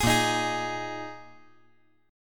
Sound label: A sharp 7th Suspended 4th A sharp